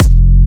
Jump Kicks, Bassdrums

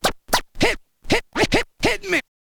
scratch kit, vinyl

